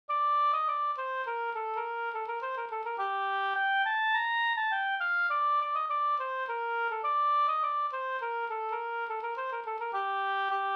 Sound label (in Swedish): slangpolska Gm